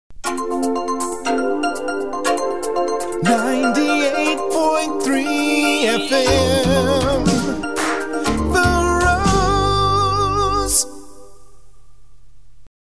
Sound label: jingle; WWRZ